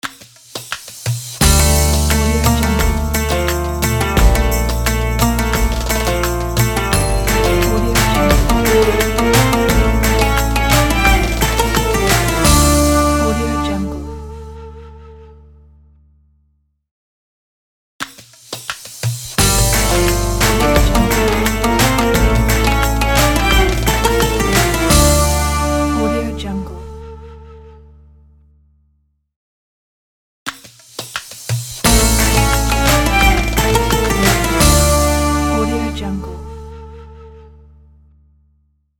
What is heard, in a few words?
arabic opener logo